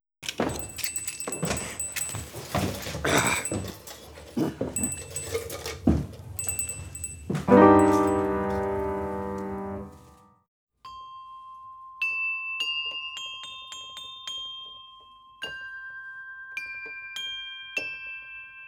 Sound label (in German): Kling Gloeckchen Klingelingeling